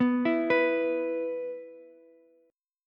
E 5th, Ukulele; arpeggiated; chord; x,11,12,14

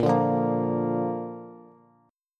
Bb Major, Bass; Bb strummed; 18,17,15,15; JGuitar

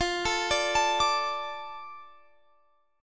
D Minor F, guitar

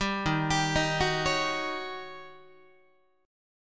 D sharp 7th Sharp 9th Guitar; arpeggiated; JGuitar

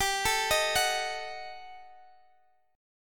Eb Diminished G; Ebdim/G arpeggiated; JGuitar

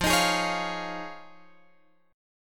F Minor 7th Sharp 5th, Guitar, Drop D

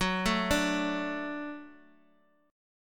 F sharp Minor Sharp 5th, F sharp, Guitar; arpeggiated; 4A,4E,5A,5D,5G#,6C#; x,x,x,4,1,1

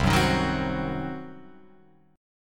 C Diminished C, Guitar Open F; strummed; JGuitar

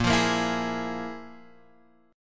F Minor Major 7th Double Flat 5th, A sharp

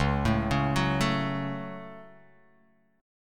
Db Suspended 4th Sharp 5th; arpeggiated; JGuitar

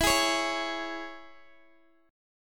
E 6th, down, Guitar, Open D

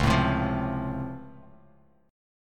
C sharp Augmented Major 7th, C sharp, down; C#+M7; strummed; JGuitar

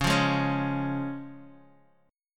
C sharp Major, Guitar, Open D; x,4,3,2,4,x

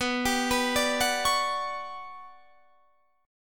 G Major Sharp 11th B, chord

